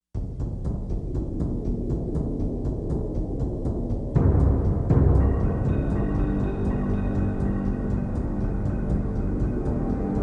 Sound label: FORTRESS AMBIENCE; MYST